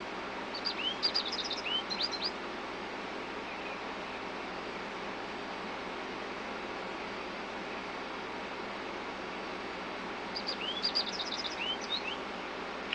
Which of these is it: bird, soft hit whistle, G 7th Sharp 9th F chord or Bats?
bird